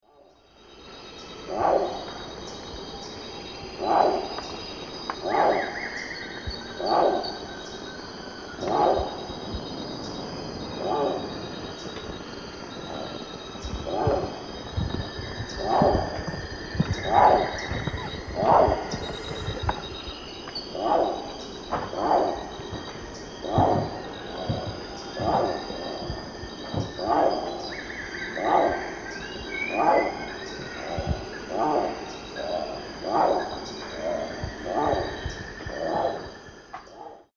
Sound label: Penelope jacquacu, calls; S. America; Eastern Lowlands